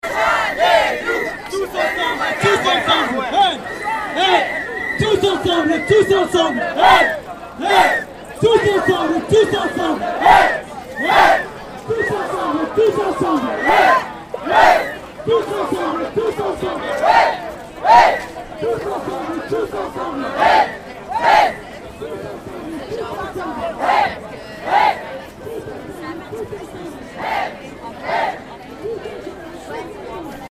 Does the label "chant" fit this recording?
Yes